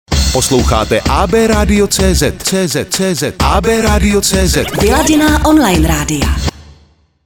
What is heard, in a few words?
jingle hip hop